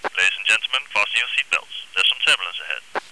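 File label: 747 turbulence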